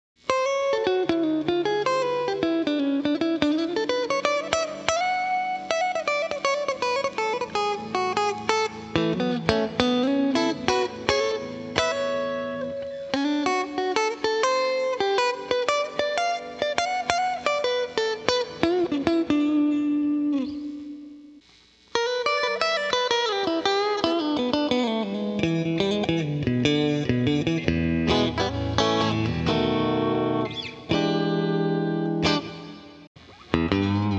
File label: Guitar Compressor, AXE FX 3